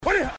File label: cam snap